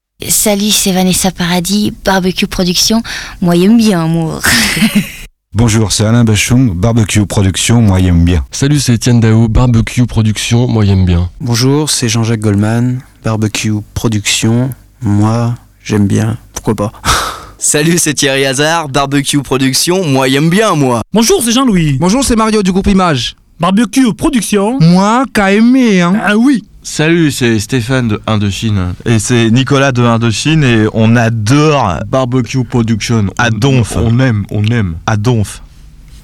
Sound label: JINGLES; STAR BARBECUE PRODUCTION